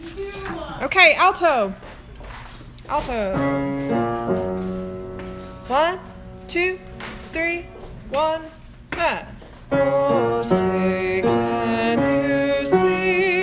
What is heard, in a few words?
alto national anthem